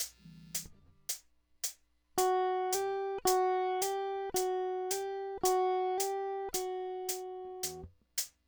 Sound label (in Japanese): ハンマリング